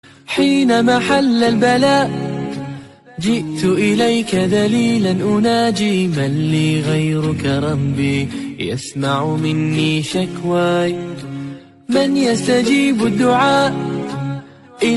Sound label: acapella